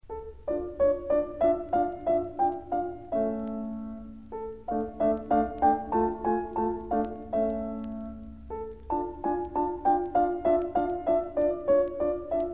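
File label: violin sonata; weber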